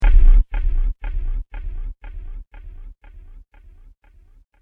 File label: Subtle Fallout With Delay, bomb; Bomb Sound Effects; explosion sound effect; Andrew Fleming